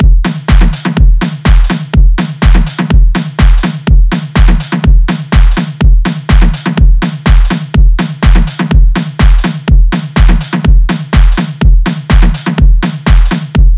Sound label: Drum Beat 124bpm; BRAZBASS; Zenhiser